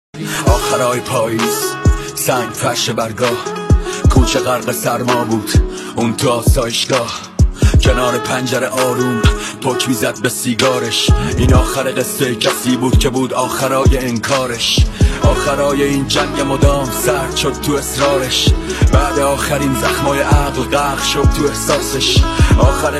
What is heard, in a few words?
music rap farsi